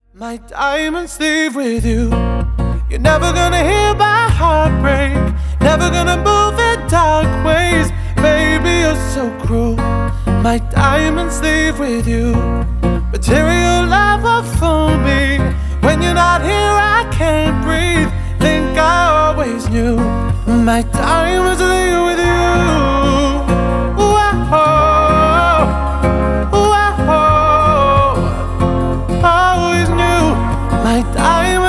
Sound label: diamonds acoustic